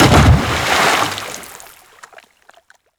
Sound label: water splash distant; Bluezone